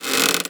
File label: footstep wood creak; gamerip